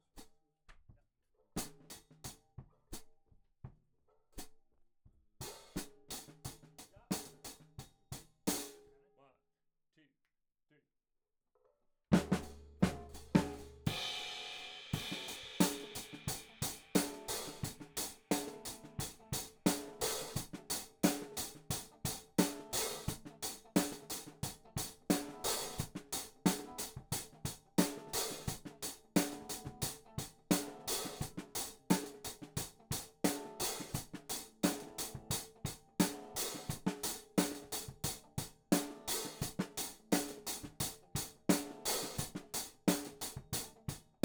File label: Hat